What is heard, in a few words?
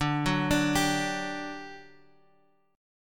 D-Major Add 11th D; arpeggiated; JGuitar